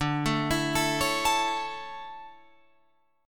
D Major 7th Suspended 2nd, Guitar Open F; arpeggiated; JGuitar

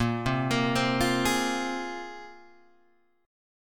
Bb 7th Suspended 2nd Bb Guitar Open G, chord

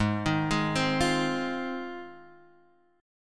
G sharp 6th

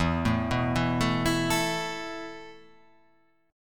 E Suspended 4th E, down

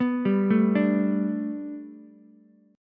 B Minor 7th Sharp 5th B Ukulele, chord; Bm7#5; arpeggiated; 4,7,5,5; JGuitar

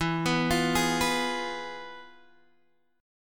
F Diminished E; Fdim/E arpeggiated; JGuitar

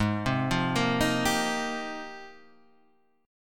F Minor 11th, guitar chord

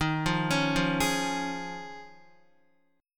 Eb 7th Suspended 2nd Suspended 4th down Guitar; Eb7sus2sus4 arpeggiated; JGuitar

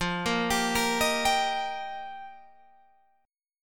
F 7th Suspended 2nd Suspended 4th, Guitar Open G

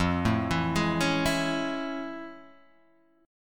A Major 7th F; AM7/F Chord {1 0 2 1 2 0} arpeggiated

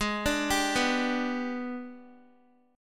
G sharp Minor Major 7th Flat 5th, Guitar; arpeggiated; x,11,14,12,11,x; 4F,4A,5C,5G,5C,6E

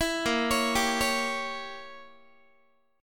Bb Diminished 7th Bb Guitar; Bbdim7 arpeggiated

